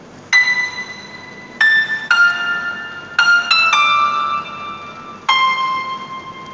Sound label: Piano